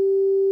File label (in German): buuuu